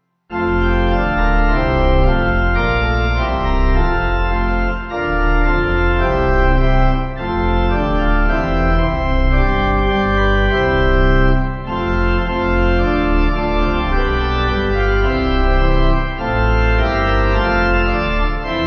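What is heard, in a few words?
Pipe Organ, Music Backing Files for Churches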